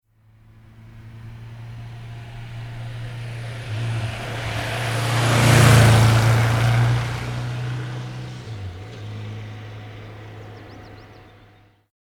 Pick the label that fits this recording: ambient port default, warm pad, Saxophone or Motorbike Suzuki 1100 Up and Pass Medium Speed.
Motorbike Suzuki 1100 Up and Pass Medium Speed